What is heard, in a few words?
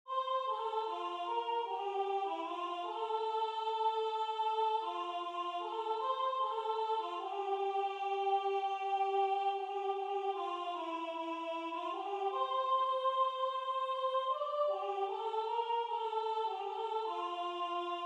Soprano; Entzun Abesbatza